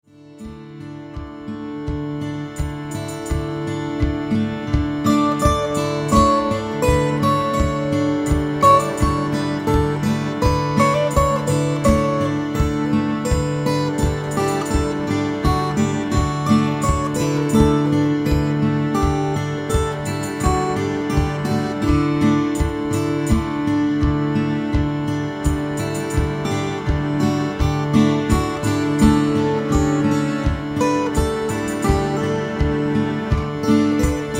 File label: Devotional